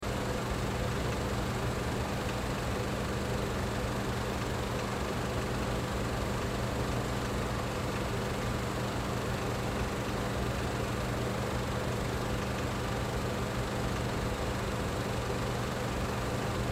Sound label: Movie Theater Projection Booth Film Projector Running Loop; Ambience; Blastwave